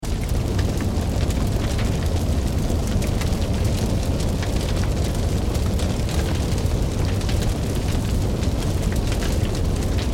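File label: noise of burning building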